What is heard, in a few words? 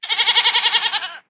Goat Panic